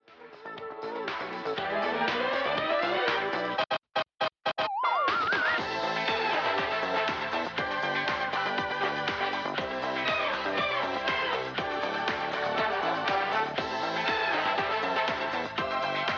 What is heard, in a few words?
Thrills at Night Stereo